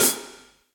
Hihat Pedal DarkPlateVerb; Drum Hits; Standard Hits; Ableton; Factory Packs; Session Drums Club

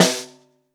SN Bottom, Snare

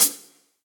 Hihat Closed Shank Cold Tight Room; Drum Hits; Standard Hits; Ableton; Factory Packs; Session Drums Studio

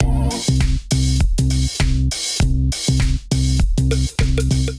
Nightly Kit Melodic 100 bpm, Drums; MIDI Clips; Drive and Glow; Ableton; Factory Packs

